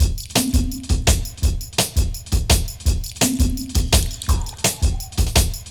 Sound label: Fountain Break, 4A, Jungle Breaks; Blu Mar Ten - Jungle Jungle - 1989 to 1999 Samplepack